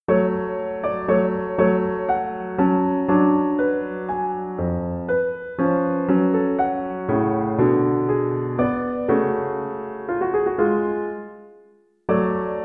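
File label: Mozart Piano Concerto 23 Movement 2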